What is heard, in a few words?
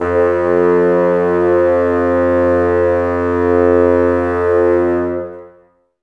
Bassoons F 2; Miroslav Vitous - Woodwind Brass Ensemble